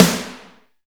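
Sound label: FORCE snare; Drums In Your FACE!; POP-ROCK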